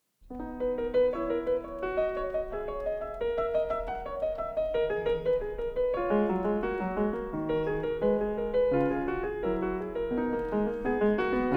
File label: Prelude in B Major, Clavier; Prelude & Fugue No. 23; BWV 868; Bach; The Well-Tempered Clavier I